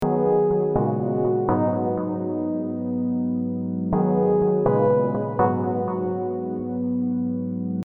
Lead Diva Deep House

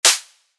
Clap One Shot

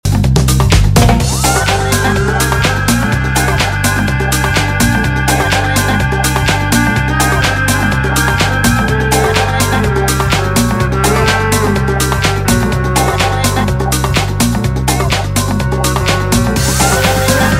tech house

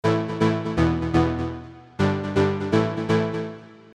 Arps Spire Deep House; deep house bundle

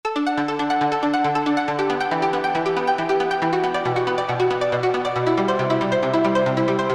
Arps Midi trance

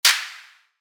Claps Samples trance

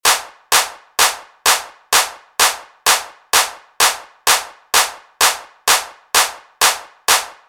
Clap Drop Loops Edm

